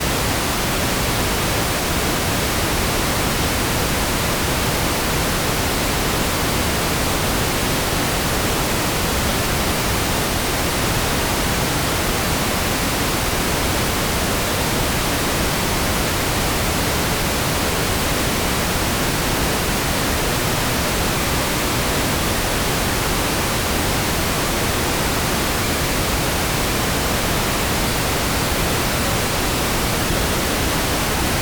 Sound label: Pink Noise; white pink noise source